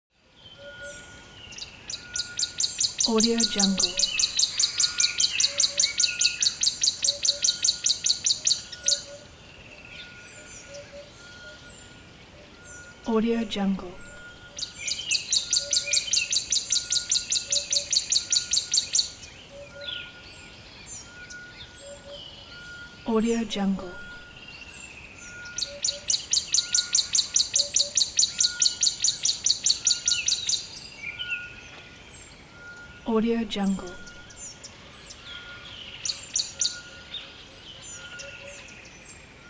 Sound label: atmo bush river dawn bird close; game